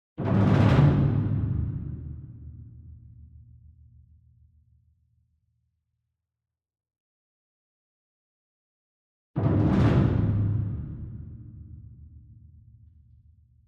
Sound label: ENSEMBLE DRUM Bass Drum Concert Roll Far; boom library; Cinematic Strikes Construction Kit